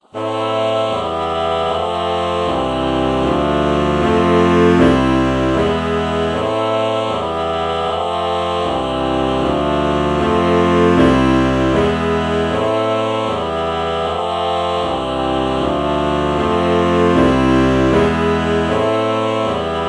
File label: alto saxophone ah chord morph